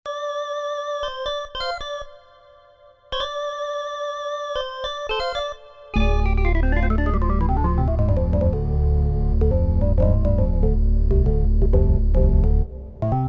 PPG Wave Computer 360 Organ

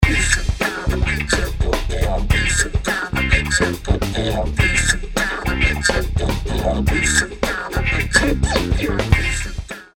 vocoder nature fm poly osc1; els vocoder eiosis

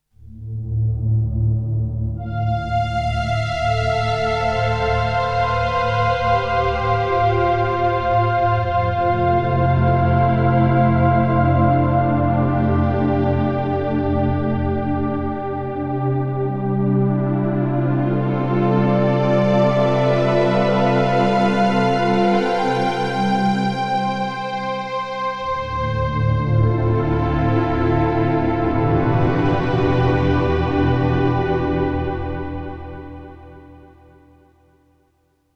synth 90s documentary

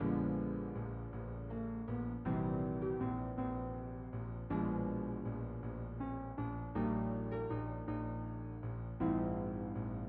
Piano; 4Front

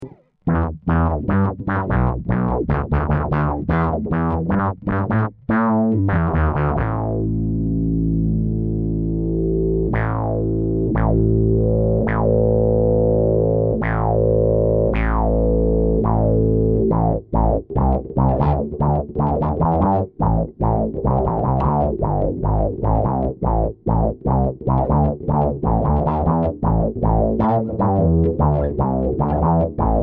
Tight synth

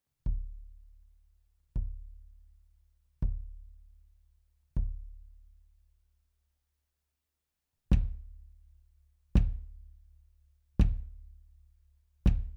POP

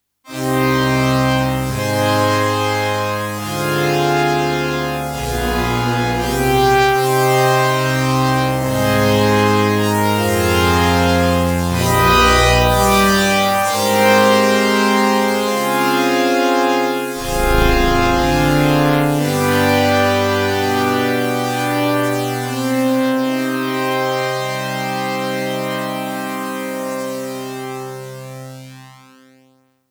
Pad SEM Notch

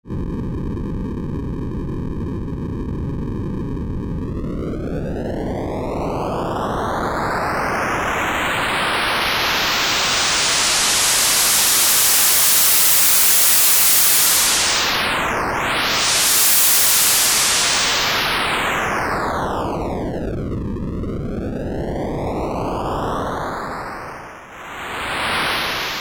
White Noise

